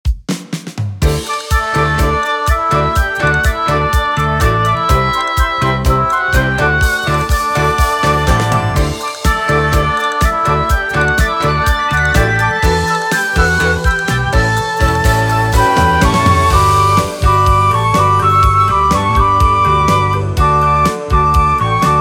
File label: Music Event